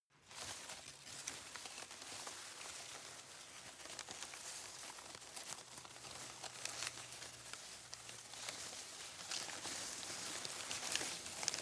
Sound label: rustle soft sequence; berufe